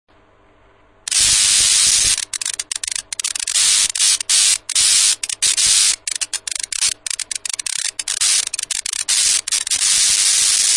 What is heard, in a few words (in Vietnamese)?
Tieng dien xet